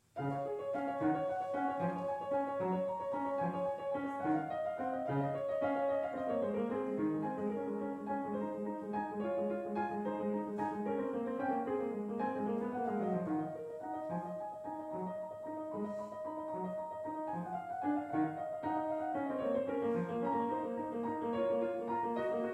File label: Prelude in C sharp